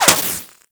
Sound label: Laser Impact Metal